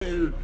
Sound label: Hurt; ludum dare 46; Boss; SlimeBoss